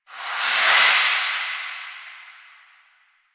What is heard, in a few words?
In Range; Jade Shadows; Birth Minigame